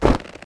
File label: pistol melee